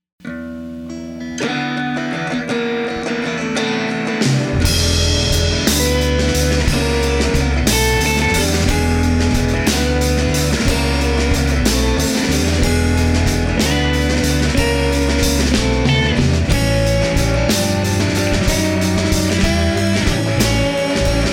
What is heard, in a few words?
Gold Mountain Blues